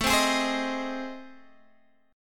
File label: A Minor Major 7th Flat 5th